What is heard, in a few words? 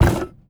staff drop, inventory; ui